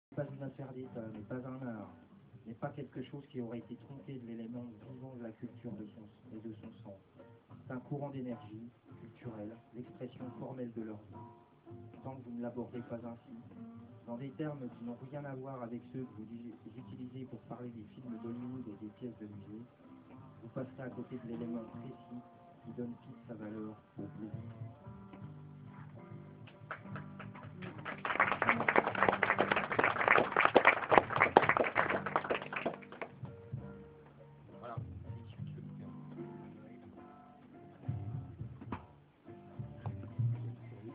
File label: Blues